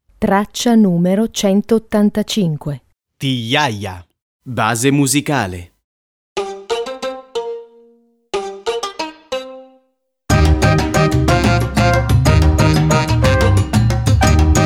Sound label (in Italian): base musicale; scuola al centro; infanzia